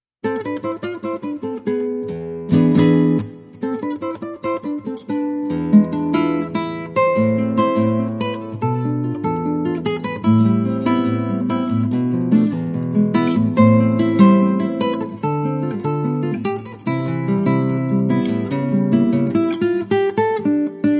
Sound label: solo